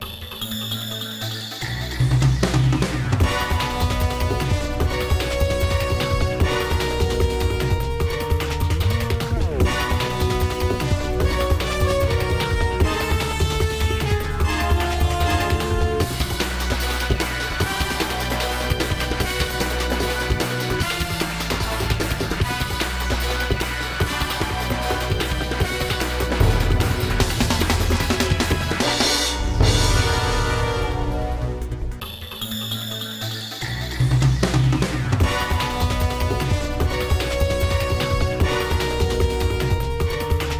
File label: Action START